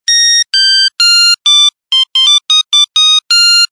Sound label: horses music mono